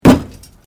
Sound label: Metal Light Movement Minor